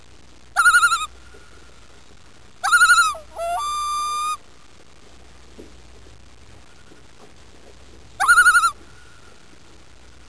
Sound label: common loon